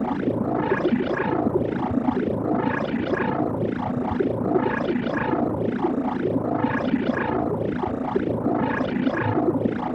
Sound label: amb portal